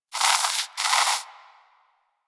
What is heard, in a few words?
Rodent Vocal Snore; Warframe; Hunting Critters